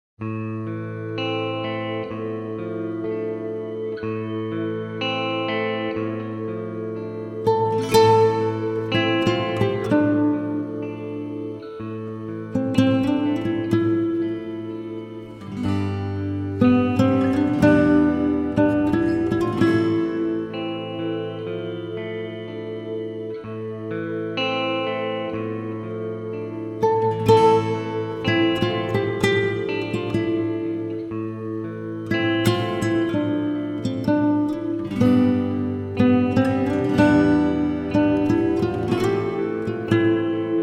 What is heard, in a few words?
Nylon String Guitar